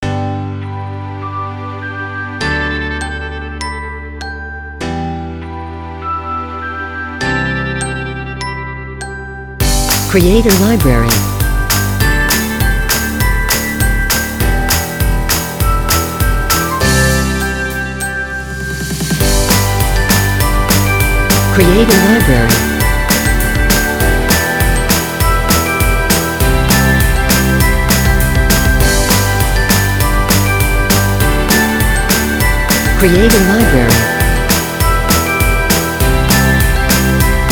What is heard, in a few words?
Upbeat